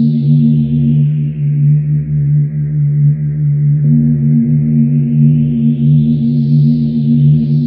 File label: Hiss Drone; Sonic Foundry (Sony Creative Software); Loop Noir